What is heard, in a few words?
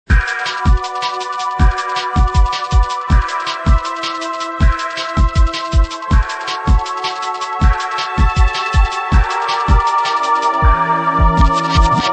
loop